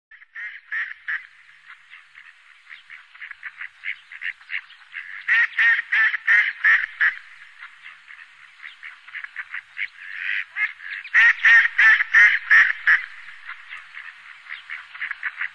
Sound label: Anas platyrhynchos; Sakhalin; Kuril; sea; priroda sakhalina i kuril